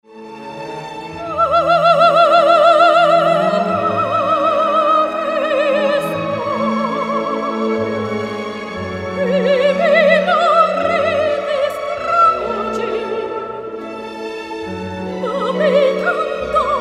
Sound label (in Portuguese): valsa museta